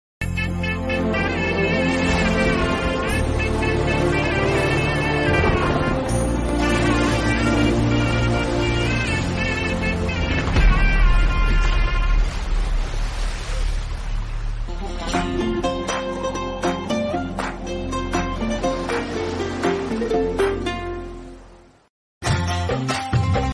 heart touching ringtone; uppena

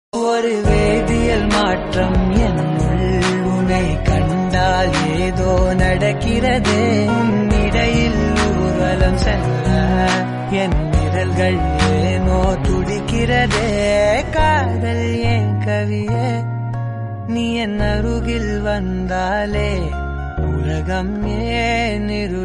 tamil song ringtone

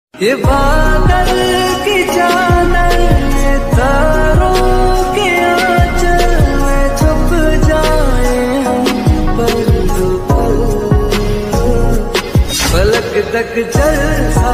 hindi ringtone love ringtones

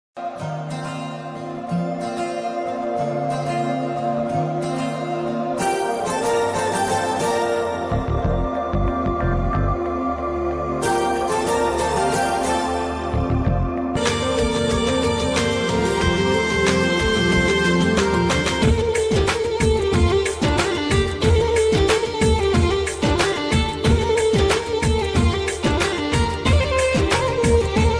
telugu love bgm ringtone; parugu

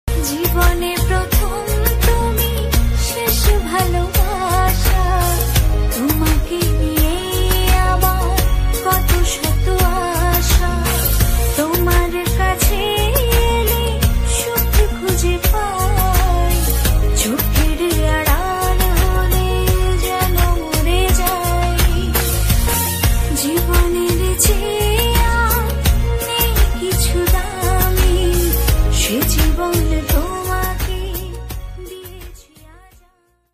bengali songs ringtone

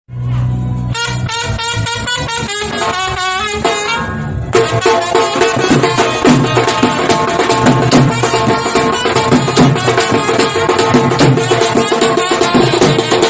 drum bgm ringtone

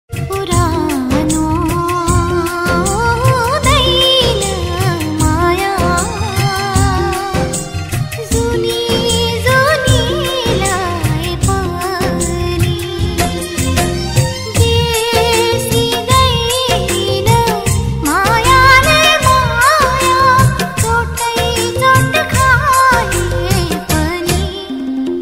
nepali love ringtone; nepali viral song

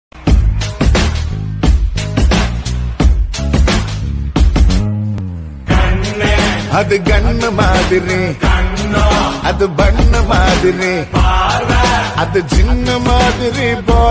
ringtone bgm

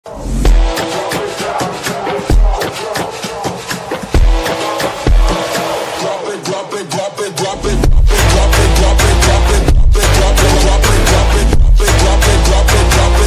phone ringtone

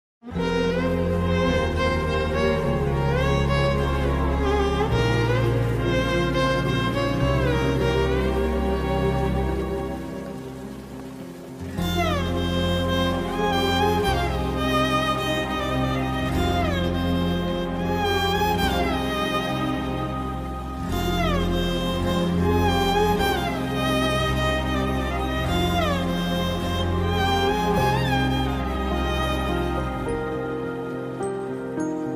emotional bgm

